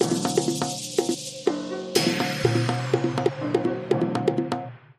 Link Sting

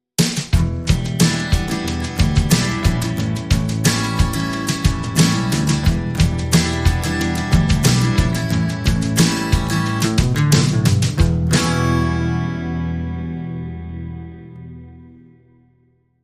Folk

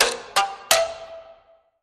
Warped Ticks 3

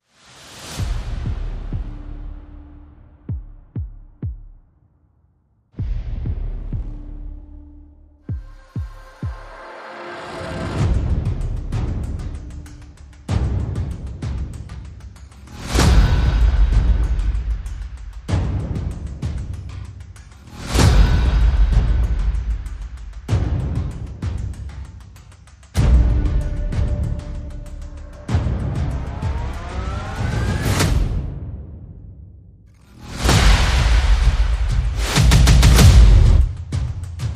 Percussion and FX

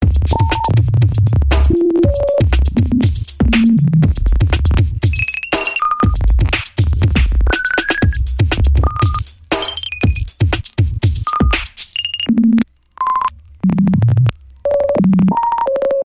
Signal Code Sci Fi Bumper Reversed

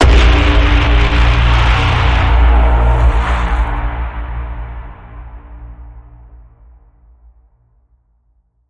Bend, A sharp

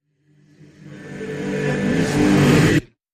Predator Vocal Reverse

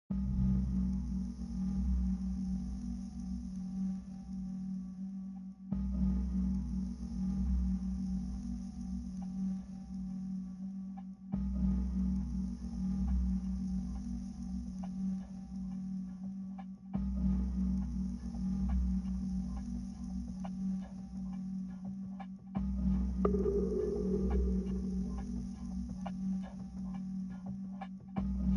Percussion and SFX Only

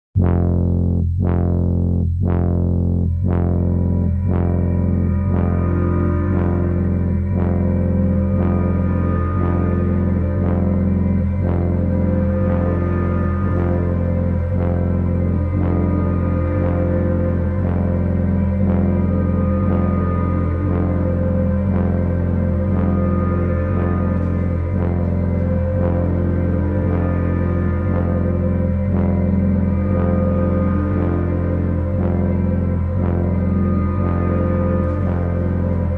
No High Synths

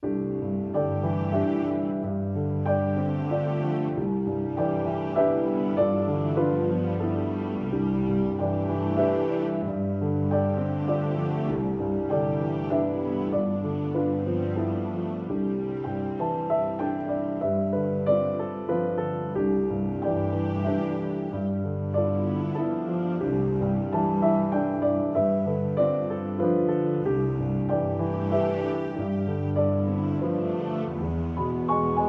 With Strings

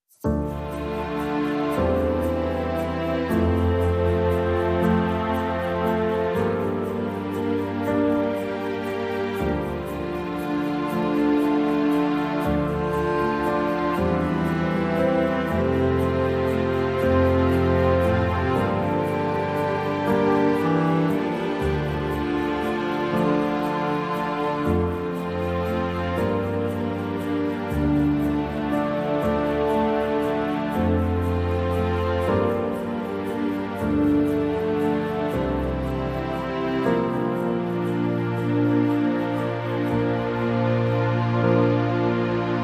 Orchestra

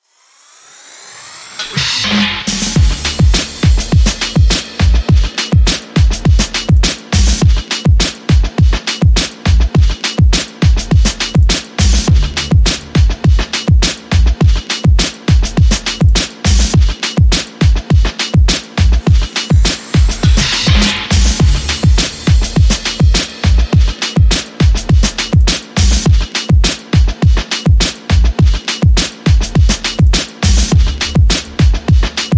Beat

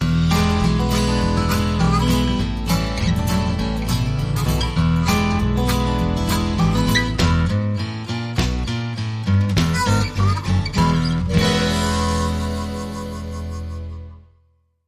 Old Hound Dog Shuffle

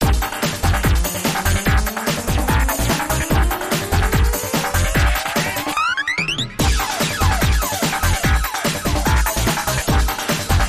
Pinball Groove

